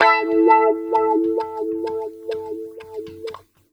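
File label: guitar, Roots Funk; USB Soundscan vol.41; AKAI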